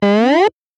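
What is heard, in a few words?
siren; sairen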